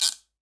Guiro B Down; Latin Percussion; Drum Hits; Wood; Ableton; Factory Packs

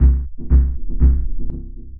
Compressed Bass; Instruments; Brooding Bass; Ableton; Mood Reel

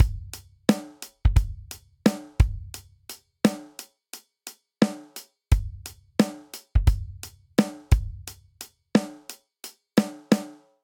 Rolling Hip Hop 01 Intro 87 bpm, drum; Ableton; Factory Packs; Drum Booth

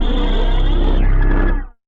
Screaming Bass; Instruments; Brooding Bass; Ableton; Mood Reel

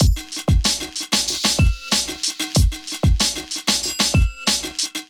Drums Streets Kit; Ableton; Factory Packs; Chop and Swing

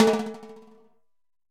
Timbales C H Pressroll; Latin Percussion; Drum Hits; Ableton; Factory Packs

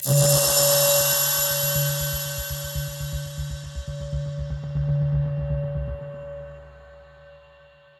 Turtle Drone; Instruments; Laboratory

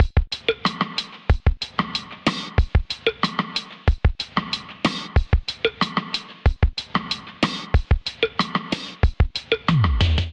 Kit 93 bpm, Drums; MIDI Clips; Feel Right Kit; Drive and Glow; Ableton; Factory Packs

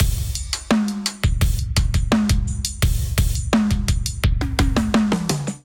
Kit groove 85 bpm; MIDI Clips; Exposed; Ableton; Drum Essentials

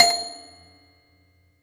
hard F5, Glitch Toys & LoFi; Ableton Foundation FreePacks

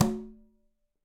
Tumba A Slap, Latin Percussion; Drums; Drum Hits; Conga; Ableton; Factory Packs

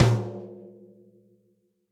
Tom Mid Room; Drums; Drum Hits; Ableton; Session Drums Studio; MPE

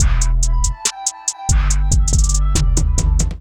Kit groove 141 bpm; MIDI Clips; Lit Kit groove; Ableton; Factory Packs; Drum Essentials

